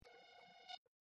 hail beep, computer

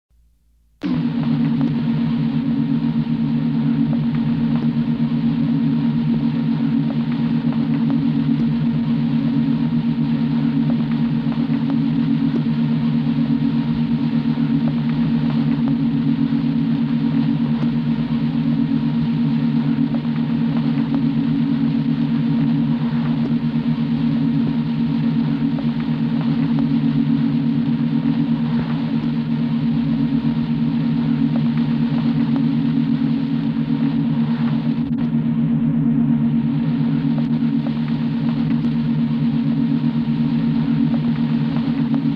corridor; background; TOS

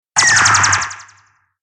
phaser stun

